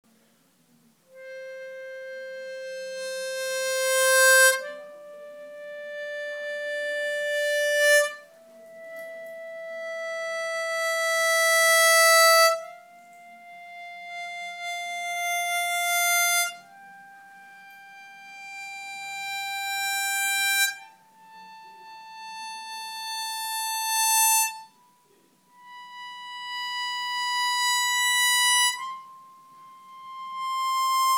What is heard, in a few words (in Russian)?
Играть гамму «до мажор» +4-4+5-5+6-6-7+7 , используя 3 правила качественного звука и выводя каждую ноту «от тихого к среднему»., губной гармошке